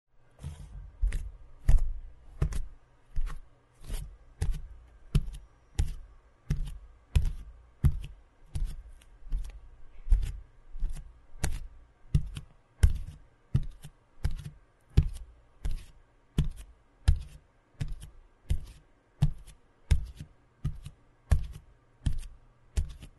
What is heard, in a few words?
Asioto (footsteps); Ib; macOS; Windows